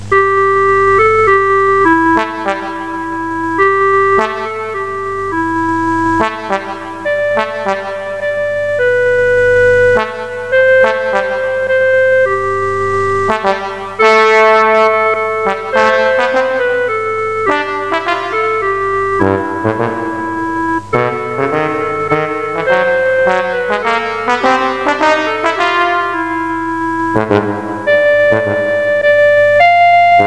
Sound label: trombone